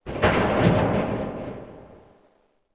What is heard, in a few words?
Goodyhut Metal; Ambient; Sid Meier's Civilization 4 Complete; Beyond the Sword